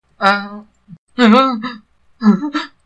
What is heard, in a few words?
pain; cstrike; radio